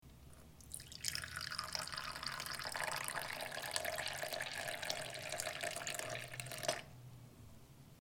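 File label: pouring coffee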